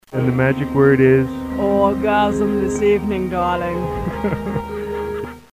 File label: orgasm